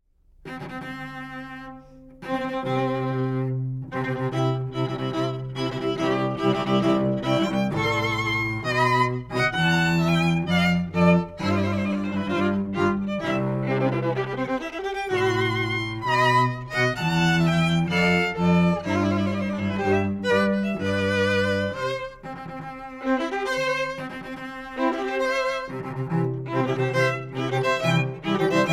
Wedding March string trio; Classical; Mendelssohn

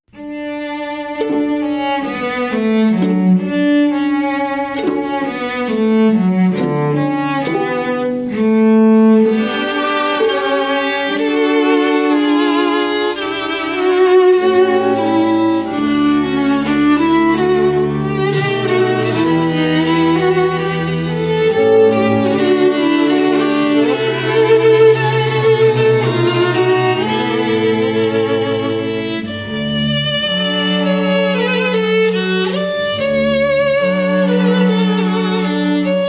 String Quartet; Classical; Violin Guitar Musician

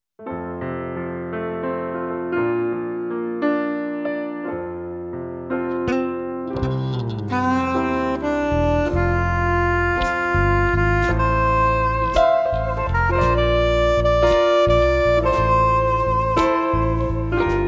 Soprano Sax; Swing

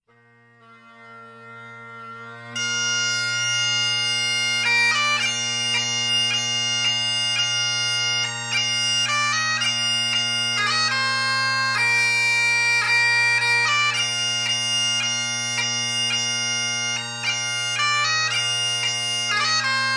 Hymn, Bagpipes

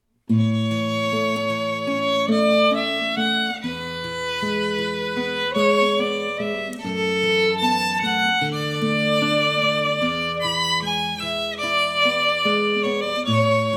Violin and Guitar

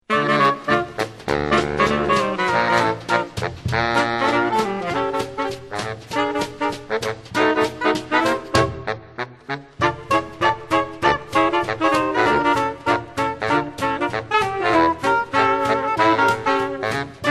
Saxophone Quartet

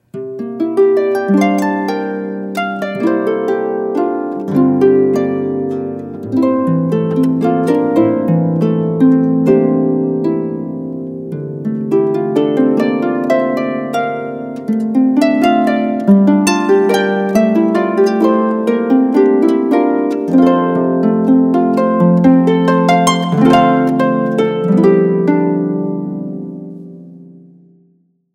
Arabesque, harp

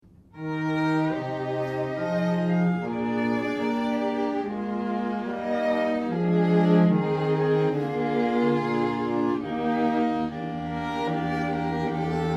Bist Du, string quartet

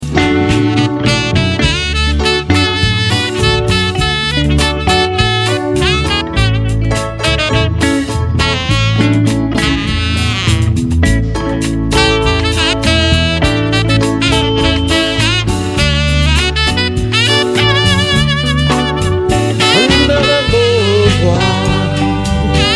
Jazz Piano

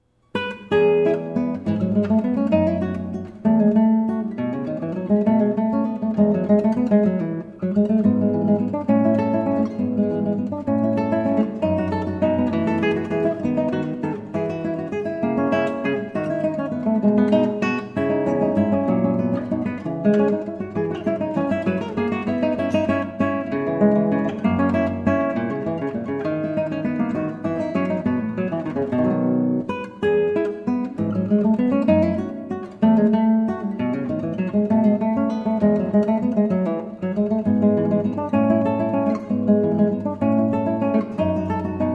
gigue, classical guitar; J.S. Bach

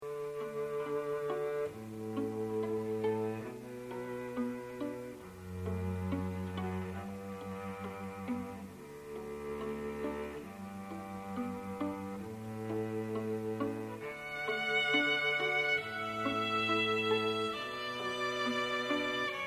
Canon in D, String Quartet; Pachelbyl

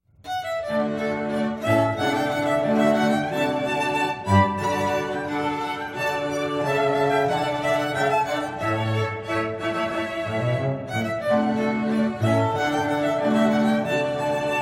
Mouret Rondo, String Quartet; Classical; Heritage String Quartet

